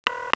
phone

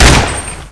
fire, colt

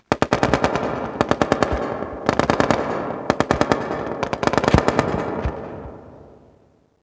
battle noise; firearms; thanatos

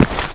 player step

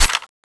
clip out; thanatos7

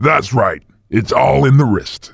cheer; svencoop; voice commands; SeriousSam

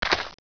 footstep dirt; footsteps; gearbox

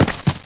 dive land

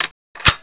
bolt out, 50cal

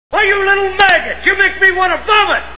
vomit; firearms

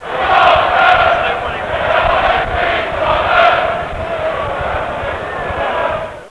chant, soccer; firearms

